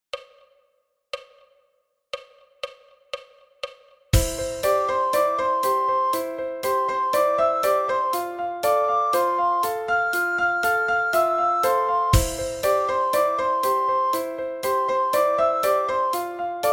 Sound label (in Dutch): sopraan